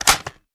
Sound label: reload magin; viper